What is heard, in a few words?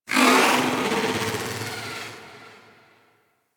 Sentient Lair Exterior Creature; Misc Creatures; Warframe; Ambience